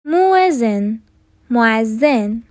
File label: muezzin